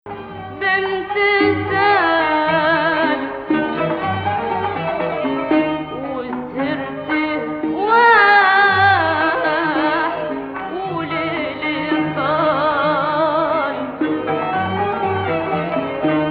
Hijaz, maqam

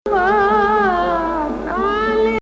Hijaz, maqam

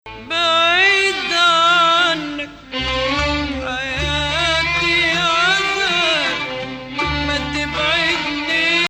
Bayati Nahawand, maqam

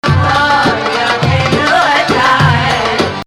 Rast, maqam

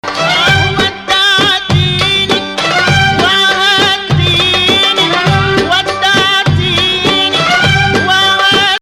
2nd Rast, maqam

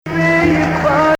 Hijaz, maqam

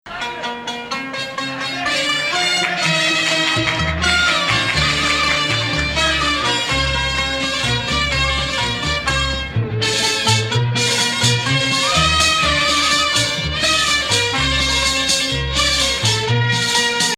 Rast Octave, maqam

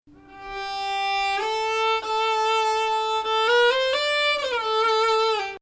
Husseini Rast, maqam

